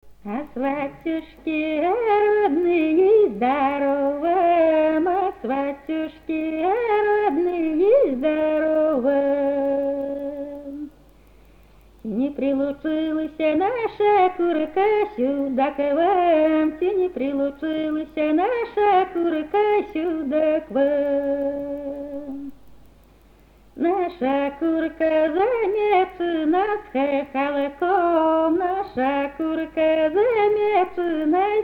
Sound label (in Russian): Русское Поозерье. Свадьба. Приезд к жениху. Застолье - А сватьюшки, а родные, здоров вам; Фольклорный фестиваль имени Ольги Сергеевой